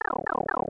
pipe; smw2

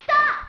stop; ta-snes

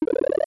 power-up appears; smw

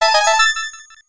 red coin

